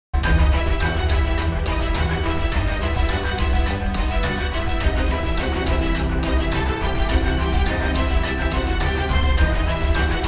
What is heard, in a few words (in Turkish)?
Enstrümantal fon müzik